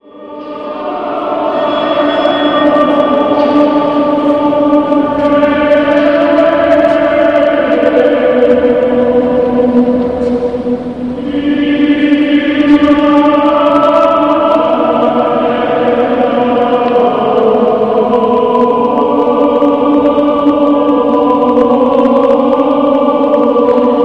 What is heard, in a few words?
Chants Strasbourg